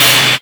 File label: buzzsaw hit world; tf2classic